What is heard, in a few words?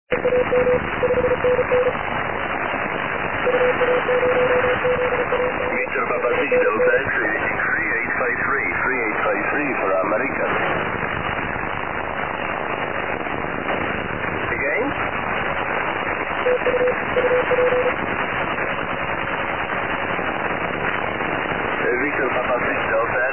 80m cw ssb